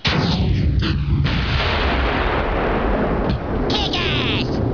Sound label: Boom; CARTMAN HUNTING